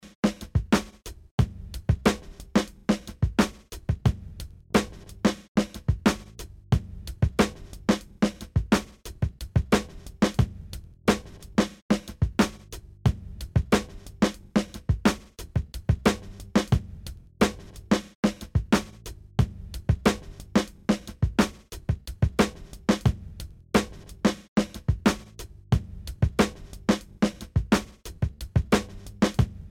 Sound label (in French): batterie